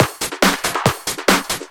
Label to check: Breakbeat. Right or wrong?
Right